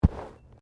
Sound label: Body movement Fall Slide Light; Body movement foley to simulate falls and deaths; Wrestling And Struggling